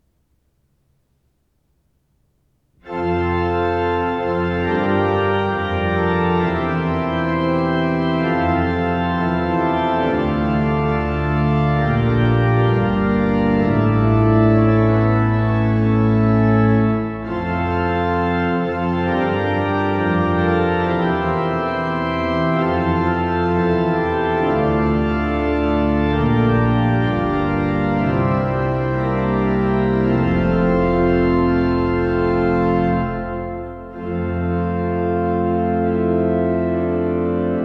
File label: Fanfare, orgelet; paulus oslo